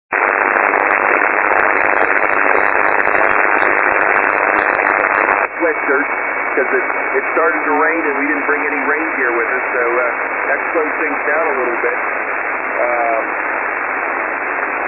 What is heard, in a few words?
noise cancel